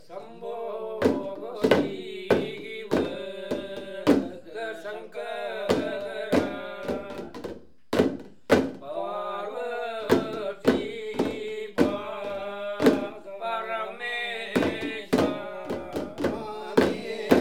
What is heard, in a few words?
Puls sound example; Kaveriamme Pat excerpt